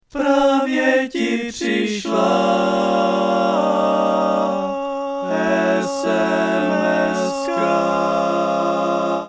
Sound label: barbershop